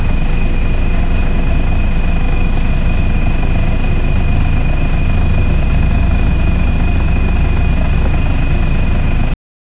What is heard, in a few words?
a realistic emd 645 turbo, diesel